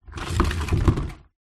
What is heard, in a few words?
household mop bucket plastic empty move slide on hard floor